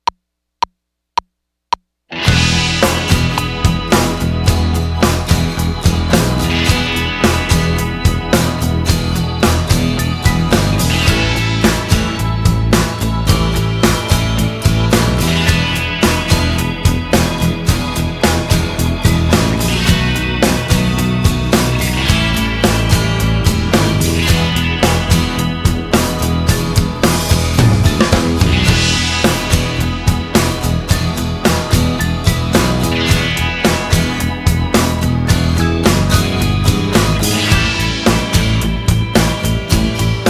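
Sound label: E Blues Double Stops without Guitar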